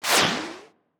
Science Fiction Sci-Fi Laser Short

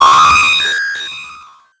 Transition Feedback Electronic; Adobe; Production Element

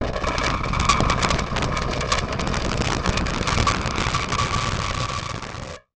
Transition Distortion Explosion; Adobe; Production Element

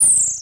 Digital; Multimedia Internet CD-Rom Flash

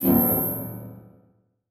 Transition Metallic Hit

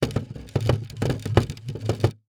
Household Wood Chair Rattle

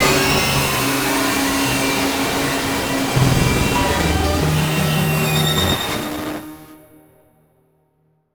Imaging Distortion Musical Tones Layered; Module Production Element Title Imaging

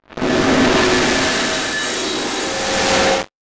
Transition Noise Static; Production Element

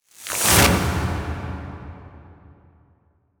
Title Transition Burst; Adobe; Production Element

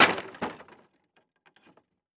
Crash Wood Short; crashes; AdobeCC

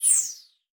Multimedia Internet CD-Rom Flash Whoosh Short

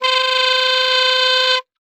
Cartoon Clarinet Noise Maker; AdobeCC

